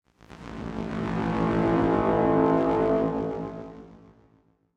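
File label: oracle ambience; assassin s creed chronicles india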